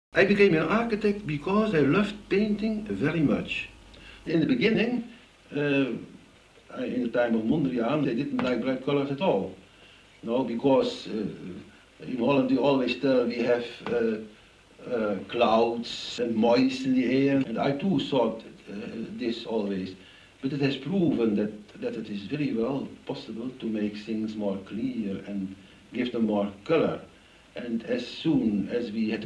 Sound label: oud mono; city of the future